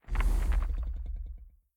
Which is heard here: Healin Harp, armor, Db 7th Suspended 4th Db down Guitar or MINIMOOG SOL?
armor